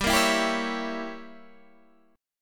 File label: G Diminished 7th, Guitar, Standard